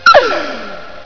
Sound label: squeak